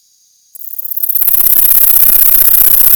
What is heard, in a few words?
Neoconocephalus affinis clipped short